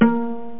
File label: pluck, net instrument; BrainOpera; net-music; Internet 1996 World Exposition